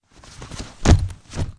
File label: thud bodyfall